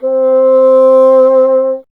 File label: WND BSN C, Bassoon; Miroslav Vitous - Solo Instruments VOL-1